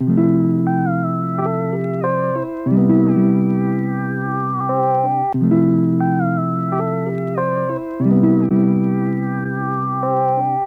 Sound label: Lofi Melody Loop 90 BPM C Maj; Melodics; Cymatics - Eternity Sample Pack